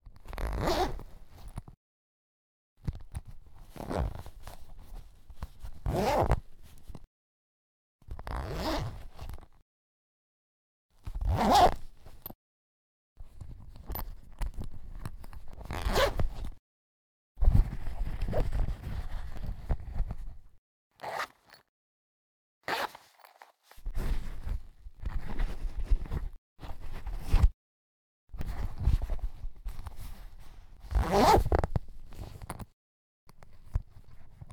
zipping purse; human; NS Studios - ultra sound collection

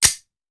Hand Gun Foley; Sound Ideas; Guns

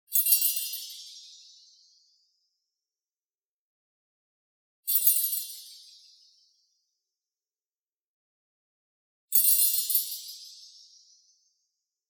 BLING Temple Bell Freq Shift; boom library; Anime Essentials; DSGNMisc

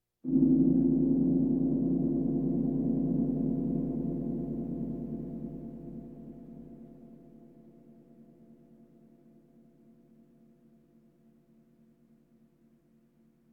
Percussion Bongos Tympani rolls Gongs Drums

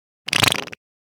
Fart Foley Surprise Bad Surprise; Hollywood Edge; sound designer's toolkit v2

